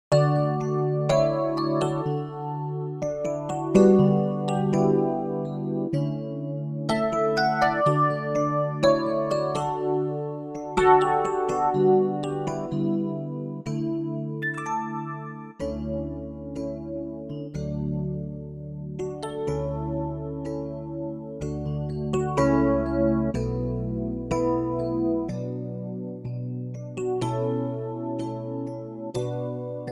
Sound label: Electric Piano D to E